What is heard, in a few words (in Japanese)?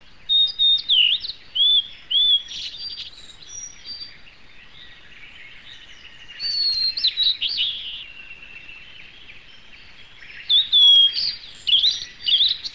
ooruri; bird